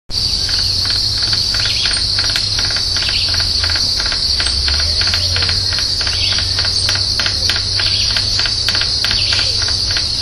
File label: Picumnus nebulosus; Garabi; Jorge La Grotteria